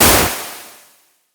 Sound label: enemy death